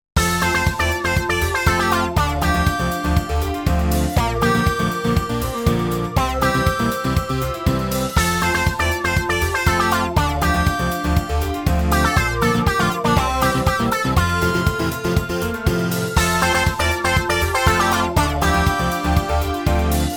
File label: Hot spring game corner